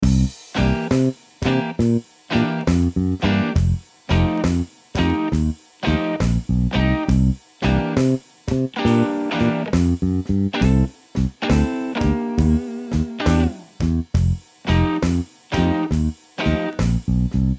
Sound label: Blues Backing Track Half Loop; 30 Must Have Blues Licks